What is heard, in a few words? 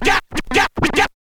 scratch kit, vinyl